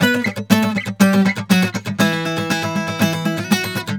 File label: GUITAR SALSA